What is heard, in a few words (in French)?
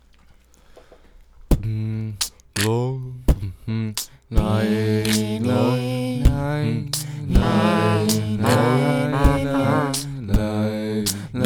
test instru bucal